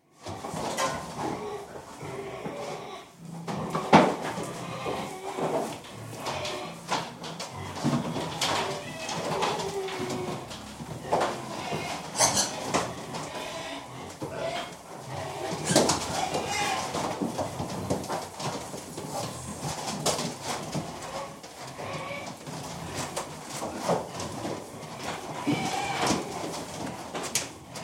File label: Piglets, interior, approx 20-30 2-5 month old pigs, scurrying around in bungalows (special fattening pens) in number, some older pigs in background; BBC